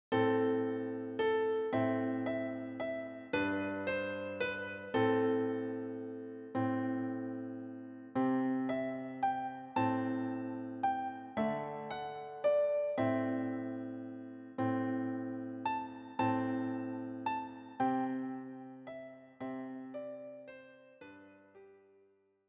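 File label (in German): Scarborough Fair Klavier vierhändig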